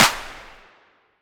reverb hand clap f key, Royality free hand clap tuned to the F note. Loudest frequency: 3294Hz; single hits one shots